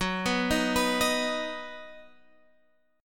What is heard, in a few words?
D sharp Minor Sharp 5th F sharp, Guitar